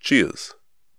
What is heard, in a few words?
cheers